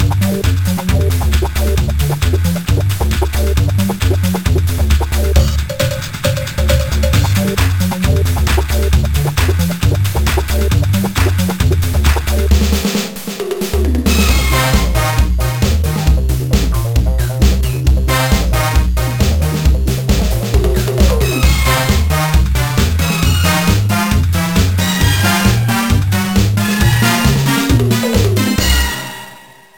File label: Disco; Urban Yeti; gba